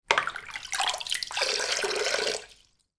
household plastic cup scoop water from sink and pour out